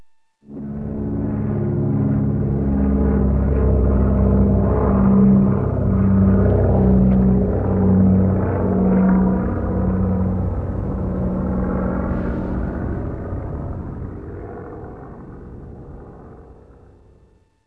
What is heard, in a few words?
cargo flyby; cstrike; rtcw